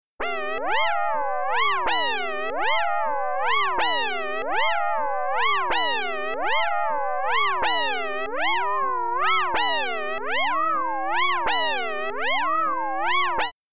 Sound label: Five FM Sounds ID by Mod Depth